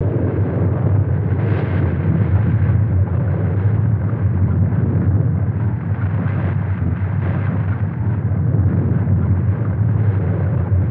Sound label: volcano